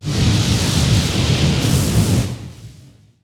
mystic flare; weapons; dota; skywrath

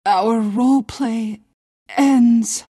pain death; queenofpain

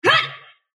sylph attack short; dota; dark willow

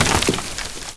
treant move; footsteps; furion

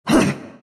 broo pain; broodmother

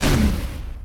range projectile launch; weapons; dota; creep

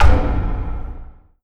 click forward; ui

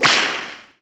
projectile impact arc; weapons; dota; razor

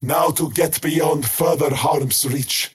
crown teleport pain; skywrath mage

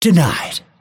deny pain; dota; phantom assassin

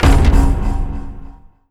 inventory ticket; ui

